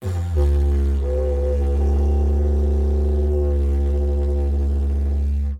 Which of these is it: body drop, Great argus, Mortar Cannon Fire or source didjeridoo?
source didjeridoo